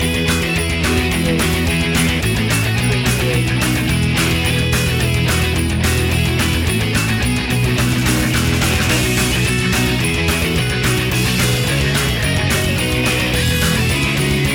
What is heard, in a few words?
rock the halls deck the halls loop